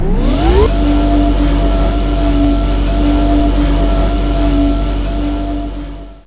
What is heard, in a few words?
core activate start